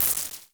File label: REZ NOIZ, Klone Dual-Percussion-Synthesiser; KLONE NOIZ NW8